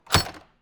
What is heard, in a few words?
test, doors industrial; dragonroll